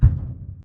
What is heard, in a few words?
footstep jog; Titanfall 2